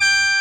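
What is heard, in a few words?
sheng F#3; AKAI S6000 CD-ROM - Volume 1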